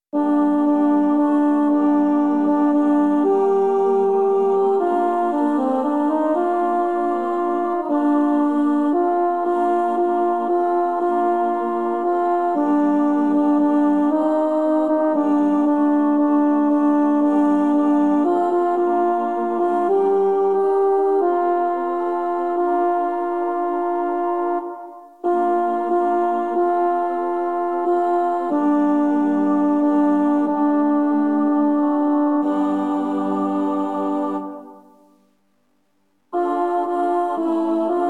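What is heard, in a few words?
Cantate Domino, G minor, Alto Instrumental; Pitoni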